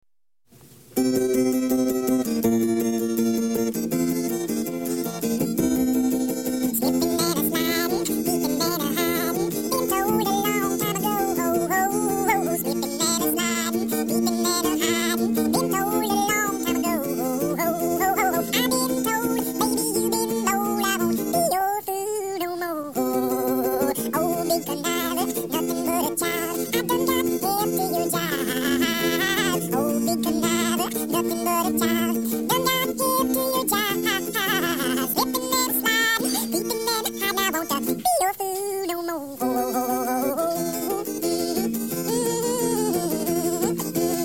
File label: Slipping And Sliding (half-speed)